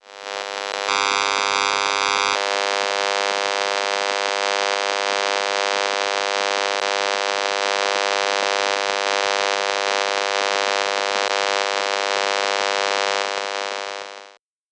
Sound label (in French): Telephone sans fil DECT 6.0; Ondes électromagnétiques et électromagnétisme